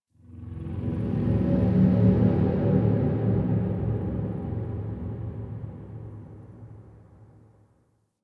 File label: ambient texture; Warframe; Ambience; The War Within; Golden Maw